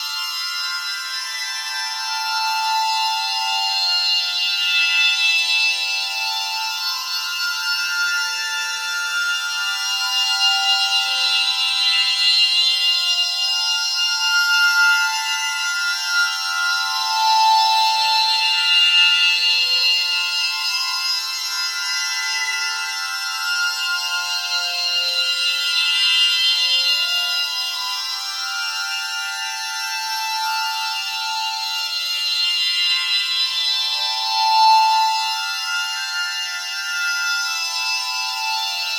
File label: Psaltry Clouds; Creative Software; Ambient Atmospheres & Rhythms; Drones Abstract; Ambient Drones+Abstracts